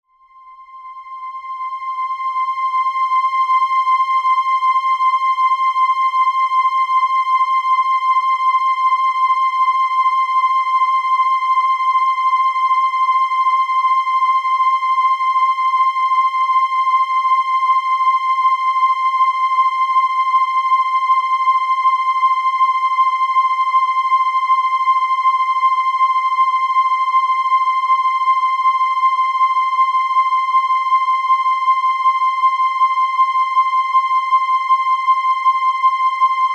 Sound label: high continuous synth tone